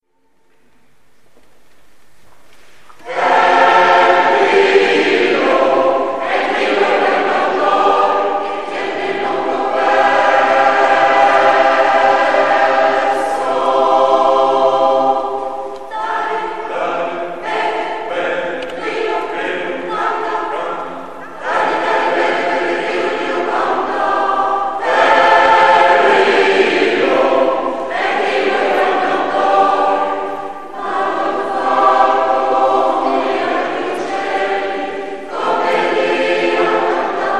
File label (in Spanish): el grillo